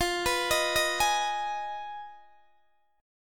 F Minor 7th Flat 5th, Guitar, DADGAD

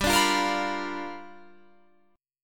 G 7th Sharp 9th G chord

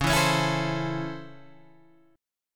Db Minor Major 7th Sharp 5th, Guitar, Open G

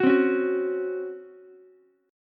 C sharp Major 7th Suspended 4th C sharp Ukulele; C#M7sus4 strummed; JGuitar

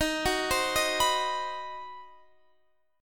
C Minor Major 7th Flat 5th Eb, chord

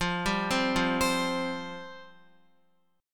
F Suspended 2nd F Guitar; Fsus2 arpeggiated

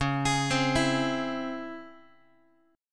Db Minor Major 7th Db Guitar

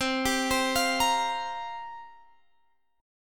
C 7th Suspended 4th, Guitar, Half Step Down

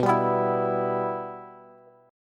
B Suspended 4th Sharp 5th, Bass; Bsus4#5 strummed; JGuitar

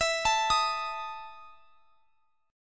E Major 7th Suspended 4th E; EM7sus4 arpeggiated

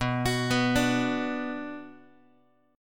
B Minor B Guitar; arpeggiated; JGuitar

